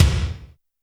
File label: kick, Roland R-70, BD